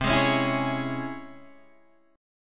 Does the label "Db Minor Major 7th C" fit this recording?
Yes